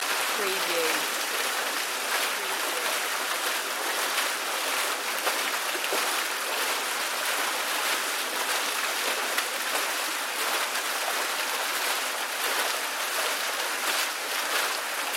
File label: waterspout fountain loop